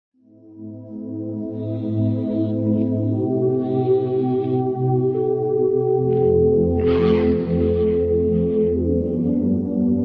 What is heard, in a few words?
mix iphone 8 ringtone